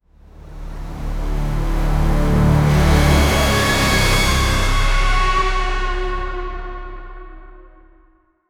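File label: jump scare riser cinematic; chase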